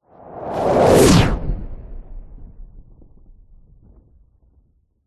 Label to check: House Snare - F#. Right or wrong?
Wrong